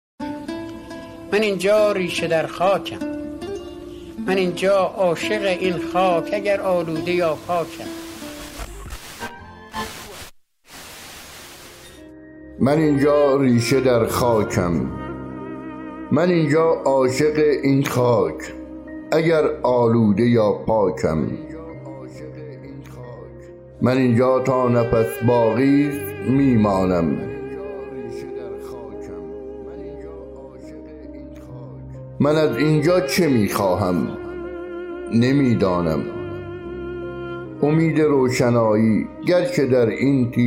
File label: Vocal